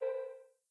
UI Tile Common; Conquest; blue archive

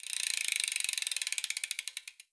Ratchet; blue archive

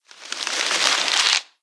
Crumble Paper; blue archive

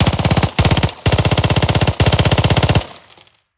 SMG; blue archive

